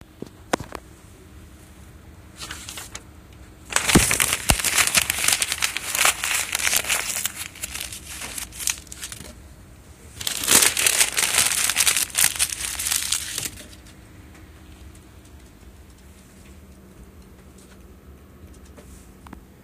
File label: recycling